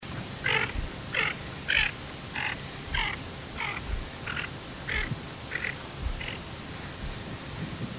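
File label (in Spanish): porron moñudo; Aythya fuligula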